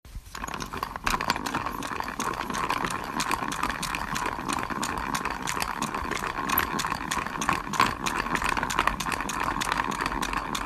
Sharpener

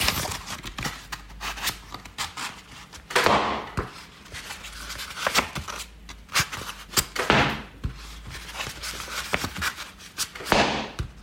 paper firework

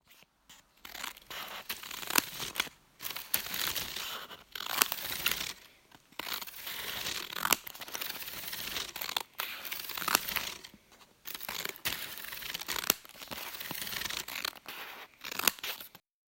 Paper Instrumental

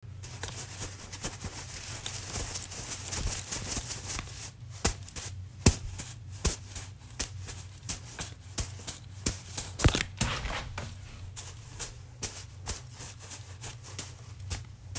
stuffed animal

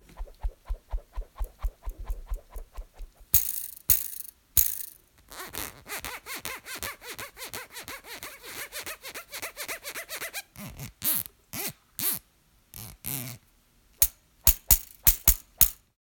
whisk

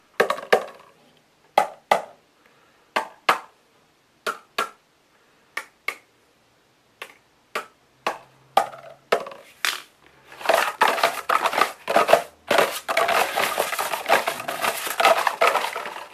Paper Pipes

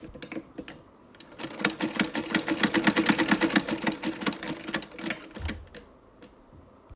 Sewing Machine

